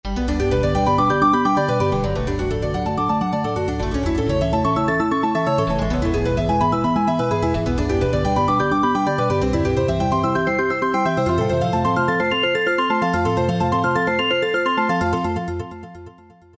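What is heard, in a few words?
arpeggio; E-mu PK-6; New Aging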